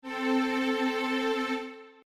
sext

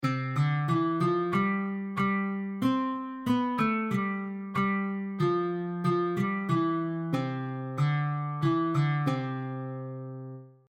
Song in Dur

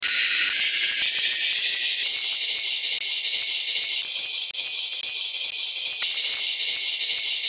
Entenrauschen

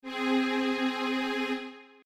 sext

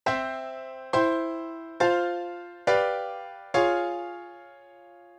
Neapolitaner am Schluss; Im Beispiel 3 schließlich steht der Neapolitaner am Schluss – da sträubt sich unser musikalisches Gefühl!

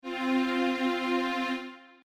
quart

